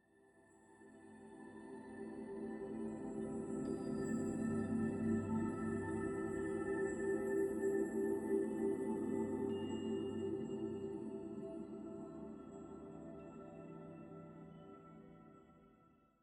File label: 85bpm; Legends of Pegasus; windows gamerip 2012